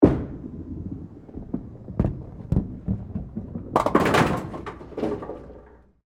Bowling Roll, Sports, Bowling, Roll, Ball, Hit, Pins, Follow; Bowling Sound Effects